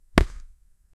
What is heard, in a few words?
shovel hitting on the earth